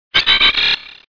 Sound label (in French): Cri